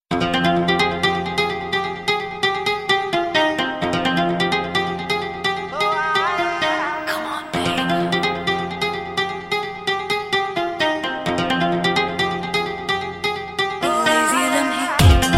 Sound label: guitar version ringtone